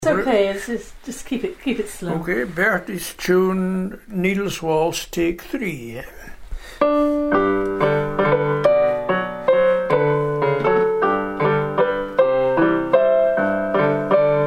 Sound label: waltz